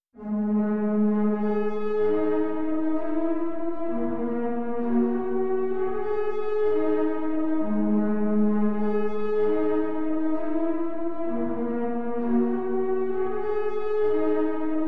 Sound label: 130 BPM G# Min Lead, loop stems; Melody; 2022 Melody Collection Platinum Expansion - Pt 1; Cymatics; Louis Vuitton